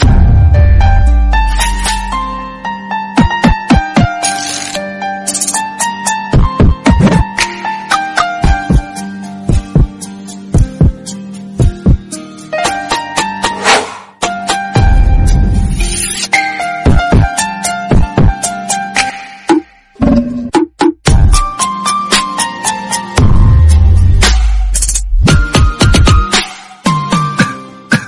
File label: instrumental remix